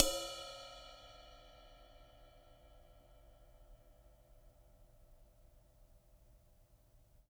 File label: Ride Acoustic 1880